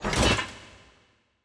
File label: main hall furniture table tools